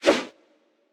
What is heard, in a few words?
gambler enter; Colin The Cat; wazdan; ultrafast